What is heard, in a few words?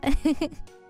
laugh